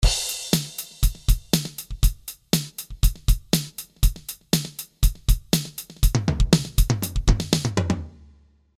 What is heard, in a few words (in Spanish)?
Midi original